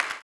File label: CLAP, Blues Soul; Spectrasonics Vocal Planet